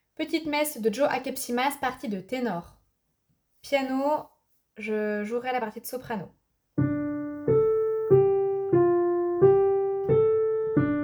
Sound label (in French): Petite messe kyrie tenor; Akepsimas